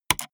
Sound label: gaming mouse left click single fast hard